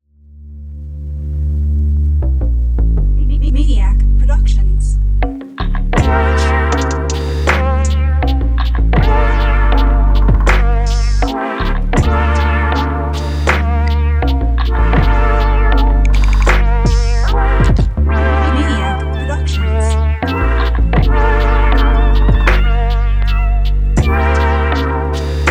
Lo-fi Groove

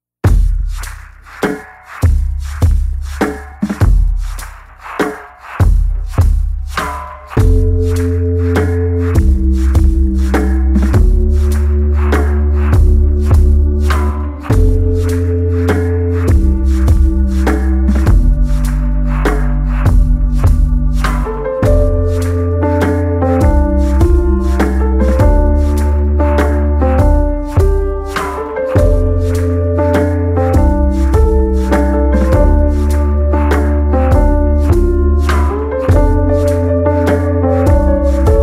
Circles Lofi Song; Pocket Pet

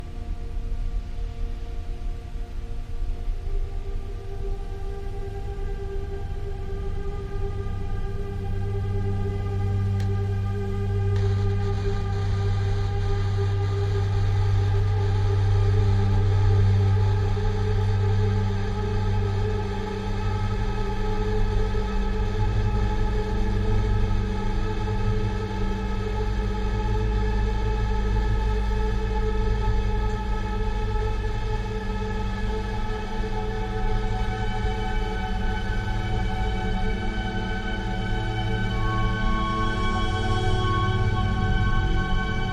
SOUNDSCAPE; CARSTEN

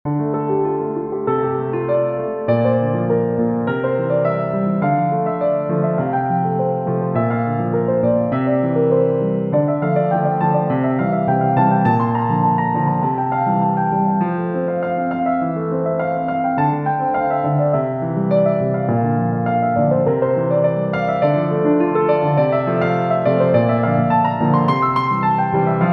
micropiano

